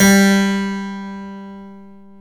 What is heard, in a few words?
KEY HRPCR, Roland; Roland - LA Composer vol. 1